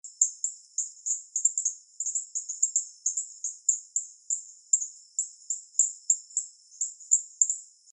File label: E gryllus, coqui